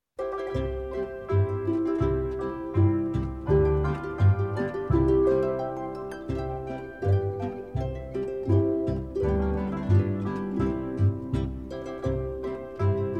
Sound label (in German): Orgel Bayrischer; Karl Heinrich Waggerl